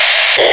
static; PC World Interactive 7

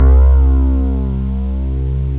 SEQ BASS

